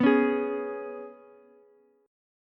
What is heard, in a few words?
G sharp Minor Double Flat 5th, Ukulele; strummed; x,11,9,11